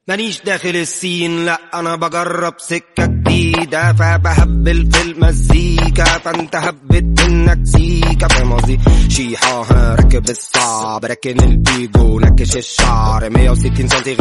Arabic song

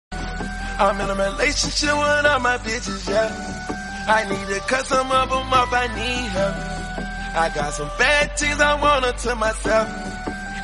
english song

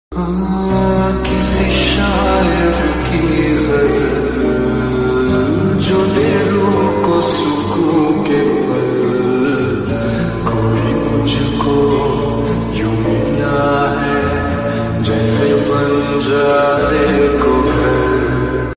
lofi hindi song

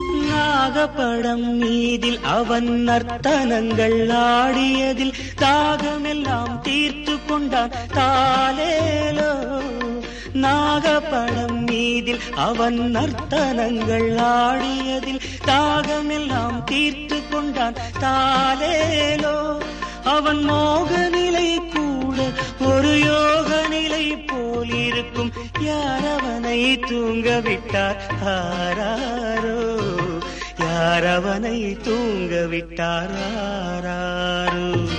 song, tamil

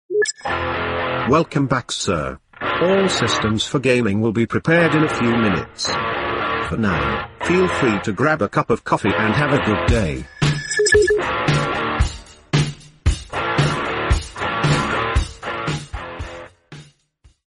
windows vista startup sound

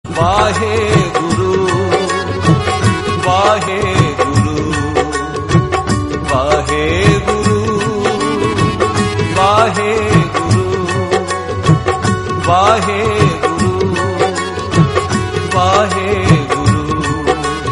waheguru simran, devotional punjabi

